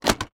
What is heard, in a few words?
Foley Toy Close Lid